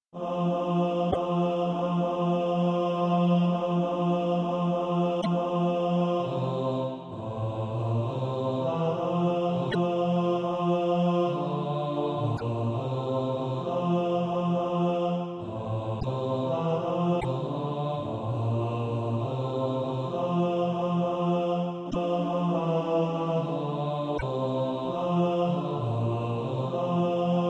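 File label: bass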